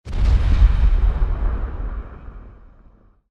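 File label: Event Dream Village Statue Break Distant Impact; the unfinished swan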